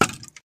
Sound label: wood